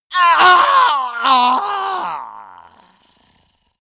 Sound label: male die gurgling; soundpool